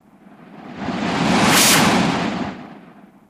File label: Pod By Phaser Blast, Pods